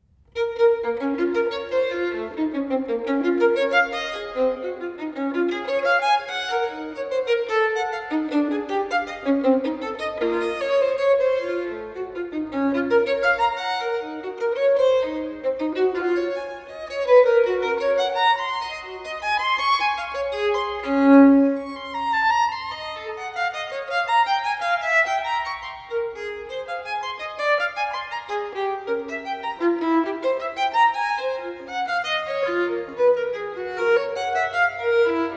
Partita in B minor, Violin Solo; Partita nr.1; BWV 1002; Bach; Sonatas & Partitas for Violin Solo

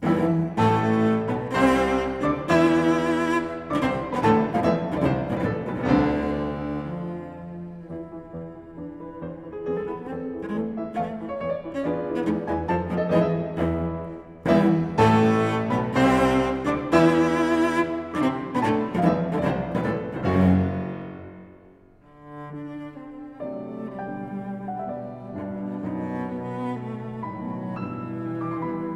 Sonata for Cello and Piano No.4 in C, Allegro v

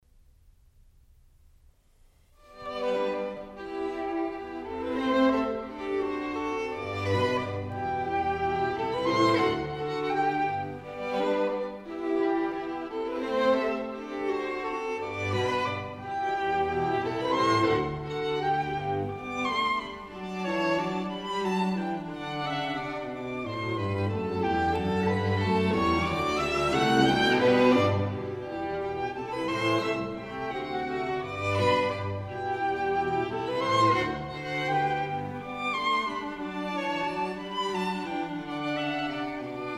String Quartet in B flat

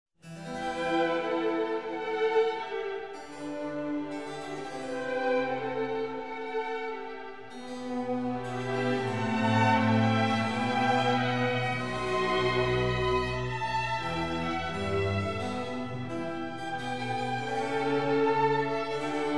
Flute Concerto In G major; Bach

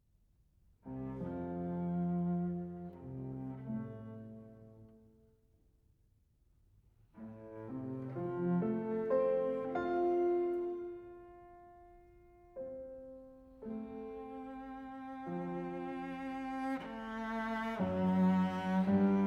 Sonata for Cello and Piano in F, Adagio sostenuto

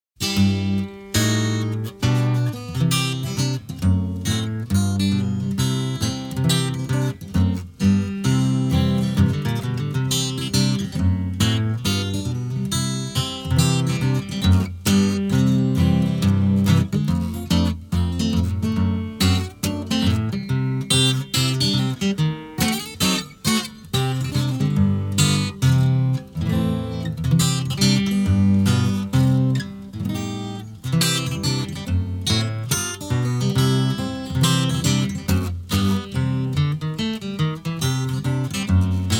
Two Step

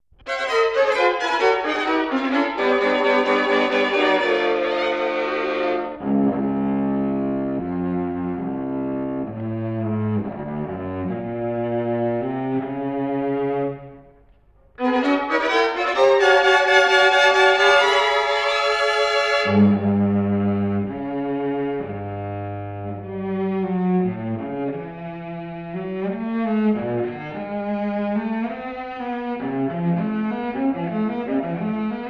Quartet A Minor, String Quartets; Béla Bartók

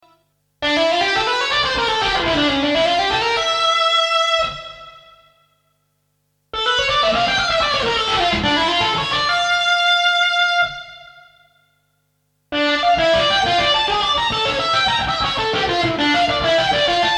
Chromatic Fantasy, String Quintet

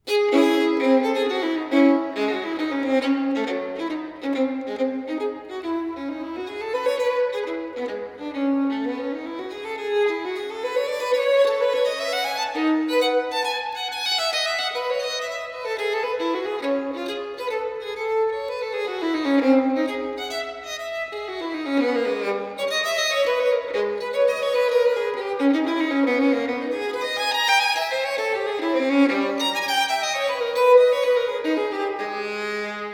Partita in D Minor, Violin Solo; Partita nr.2; BWV 1004; Bach; Sonatas & Partitas for Violin Solo

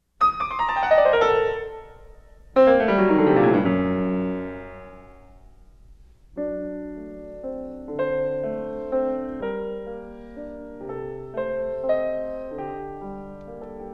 Fantasia for piano in G minor

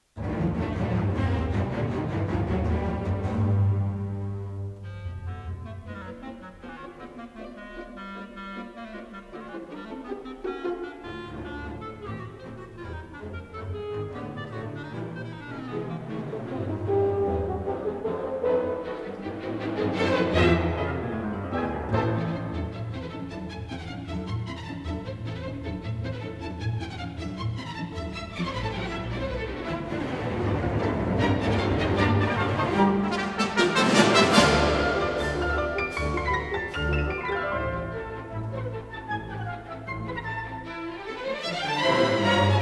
Symphony No 1 in F minor; Shostakovich; The Philadelphia Orchestra; Great Performances

